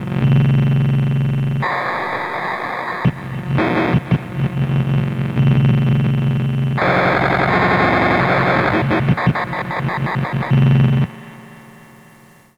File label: Haunted Transmission; Sonic Foundry (Sony Creative Software); Loop Noir